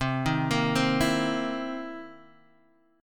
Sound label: C Minor 7th Flat 11th, C, guitar chord; Cm7b11 Chord {8 6 8 5 5 X} arpeggiated